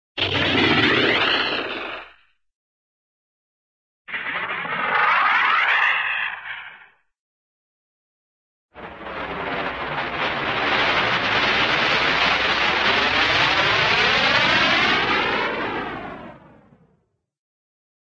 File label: sci fi rocket peel off rocket peel off; cartoon